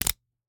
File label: Slap bracelet, smack, slap, whip, felt, plastic, hit, roll up; Eiravaein Works; Vaeyan IV